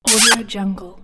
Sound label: woman kiss